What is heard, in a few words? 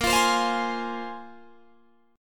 A sharp 7th Suspended 2nd down Guitar Drop D